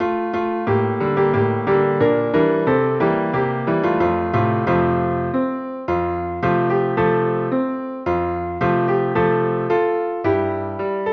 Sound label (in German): Wenn Weihnachten ist Klavier